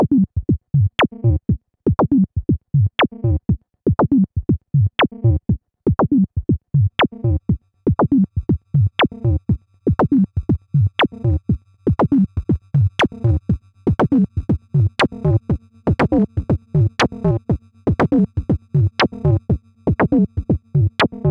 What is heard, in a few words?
seq percu; davesmith mopho